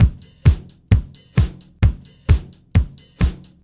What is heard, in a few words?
BEAT, Roots Disco; USB Soundscan vol.32; AKAI